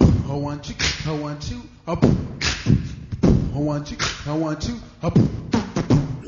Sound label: one two, Beatbox